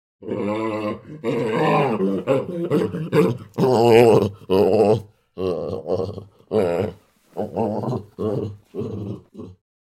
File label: Baboon Grunt Low Pitc